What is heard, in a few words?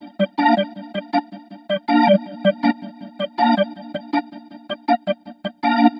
080 Roots Reg Organ; Digidesign; Pro Tools; Pro Tools Essential Demos; Essential Loop Demos; Demo Session - World